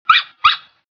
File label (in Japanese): inu